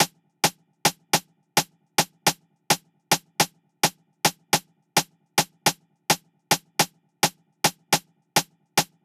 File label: Drum Loop - 106 BPM Rim, Afrobeats; PARADOX II - Drum Loop Collection; Cymatics; Tribute Drum Loop